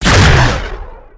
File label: Shot